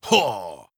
effort grunt male

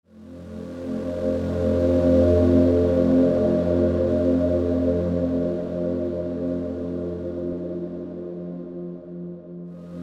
ambient menu

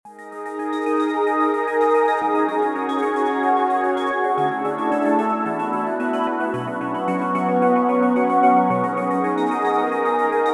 cradle

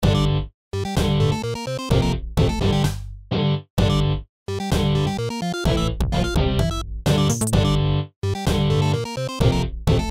BGM fight

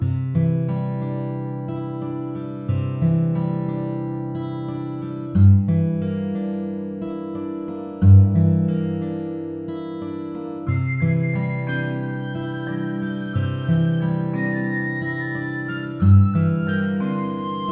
seaside village

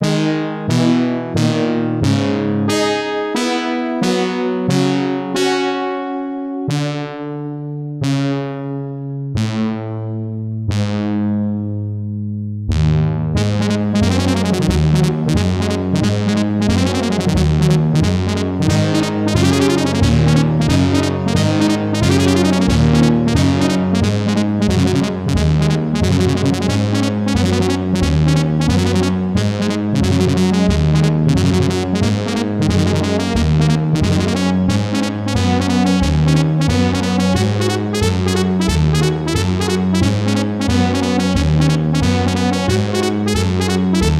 brass fanfare

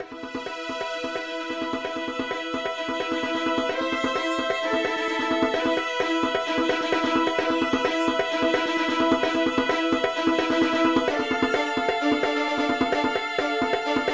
Fight Intro; Negev

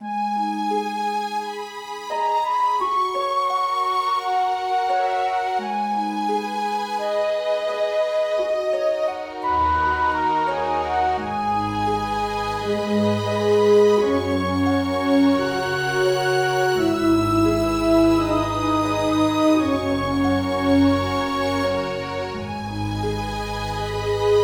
beautiful forest 16bit

